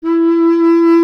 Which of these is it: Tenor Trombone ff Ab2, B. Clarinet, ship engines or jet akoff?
B. Clarinet